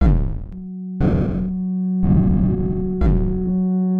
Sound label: slow intro